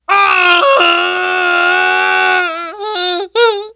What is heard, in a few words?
death scream; Bill